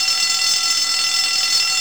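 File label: alarm bell loop; Tony Hawk's Project 8; XBOX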